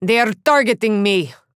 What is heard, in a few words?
Taking Damage; Ying; Paladins